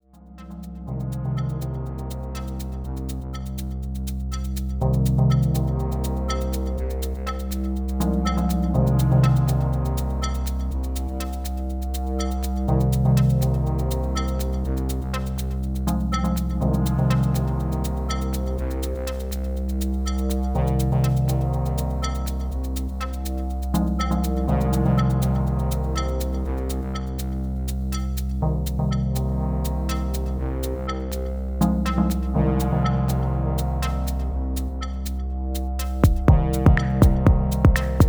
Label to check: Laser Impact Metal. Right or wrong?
Wrong